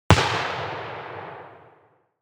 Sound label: Shotgun fire distant perspective